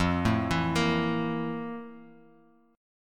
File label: F Major 11th Guitar Half Step Down